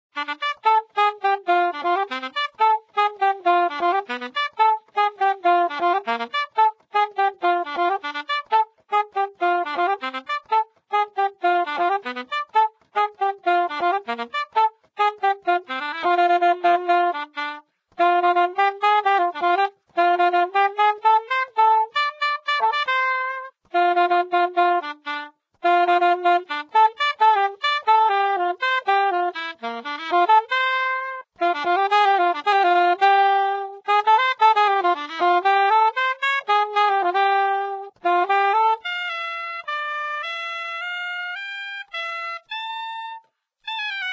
Saxophone; Zomboid; Lifestyle

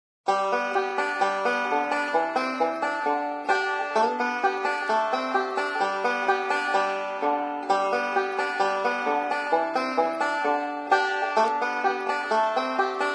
Banjo; Zomboid; Lifestyle

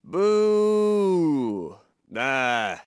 Man Booing; Zomboid; Lifestyle

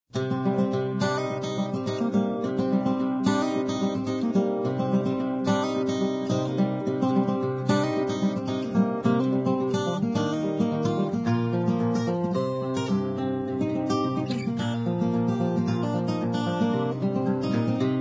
Guitar Acoustic; Zomboid; Lifestyle

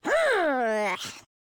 Woman Frustrated; Zomboid; Lifestyle

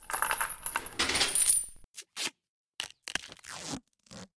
Chain Bat Crafting; Scrap Weapons; Zomboid

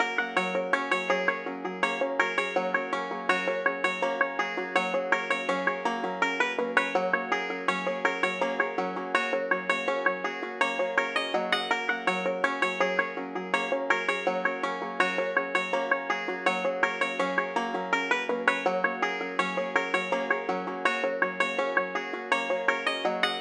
banjo; Zomboid; EntertainYourself

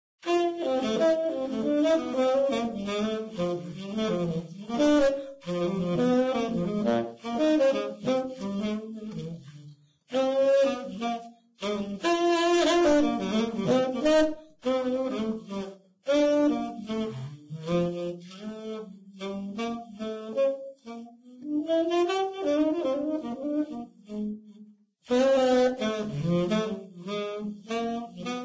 Saxophone; Zomboid; Lifestyle

